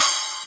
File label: splash choke; paiste 2002